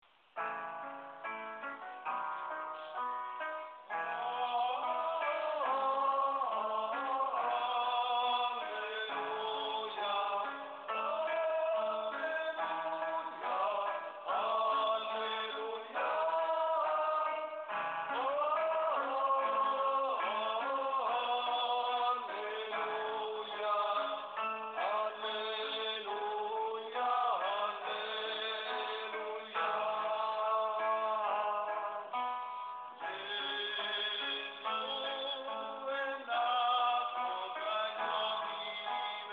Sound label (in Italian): Alleluja al Redentore (ten); canti; registrazioni